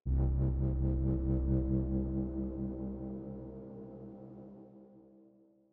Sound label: victory; dark sci-fi audio pack